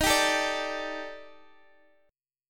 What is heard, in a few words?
Eb Major 7th Flat 5th Eb Guitar Open G